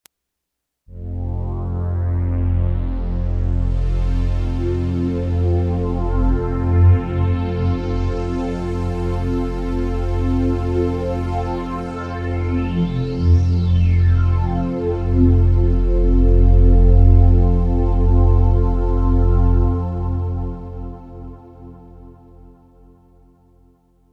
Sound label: Oasys slow dual